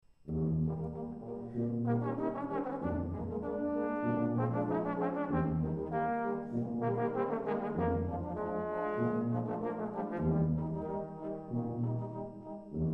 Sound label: trombone